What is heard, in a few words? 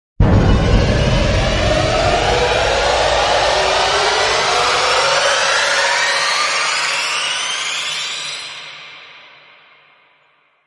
Orchestral Riser; Epic Tools vol. 1